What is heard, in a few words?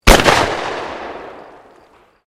SHOTGUN 12 GAUGE ZAFER POINTER UNDEROVER FIRING CLOSE, Sporting Shotguns